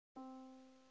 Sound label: Yamaha Disk, piano sound